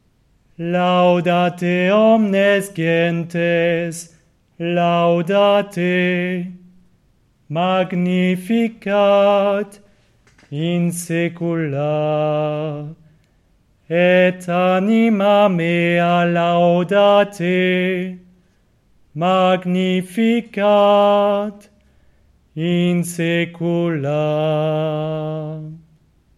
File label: Laudate grégorien